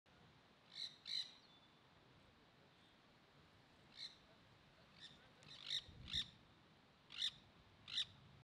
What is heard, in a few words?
Green cheeked parakeet; طوطی گرینچیک